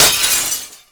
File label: glass shatter; WAR